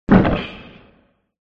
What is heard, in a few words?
boss wound; krofdor